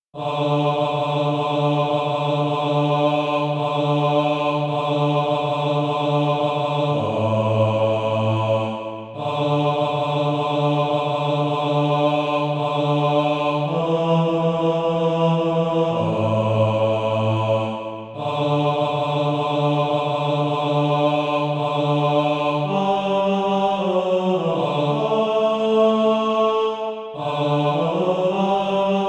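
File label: bass, Practise Music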